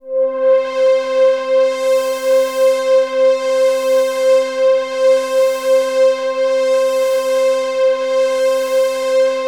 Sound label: Access Virus B; Synthworx